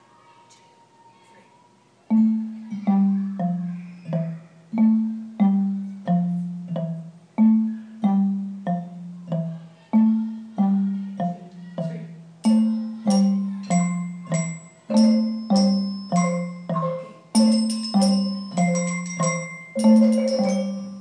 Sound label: instruments in class